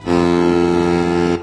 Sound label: foghorn; cstrike; bikibot